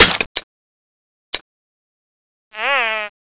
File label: fire empty, tank; cso